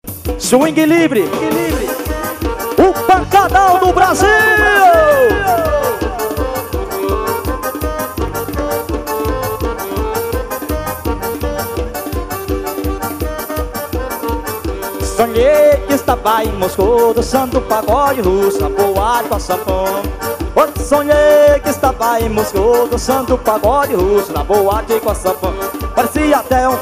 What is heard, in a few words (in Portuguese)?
swing